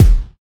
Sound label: dry kick drum sound d key